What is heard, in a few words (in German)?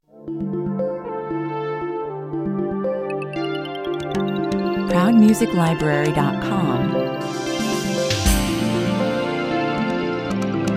Synth-Samba mit Suspense-Soundscaping, 117 bpm